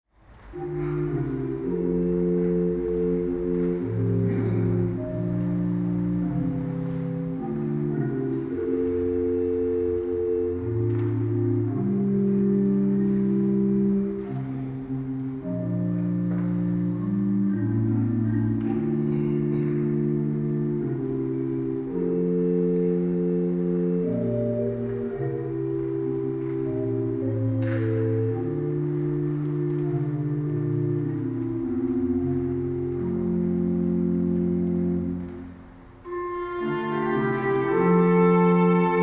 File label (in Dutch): Instrumentaal; In De Heer; Paaswake